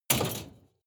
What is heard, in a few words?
metal impact scrap metal bike; hits explosionen; metall